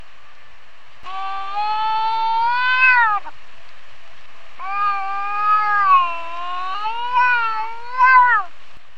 chat

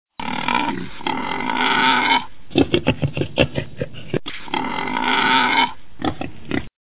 sanglier

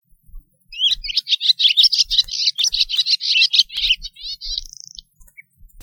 hirondelle

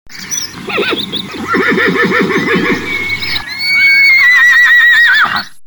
cheval

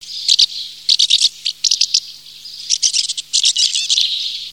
hirondelle

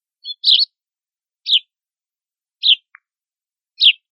moineau

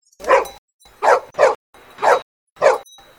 chien

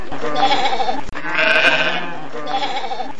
chèvre

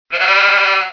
mouton, Musique

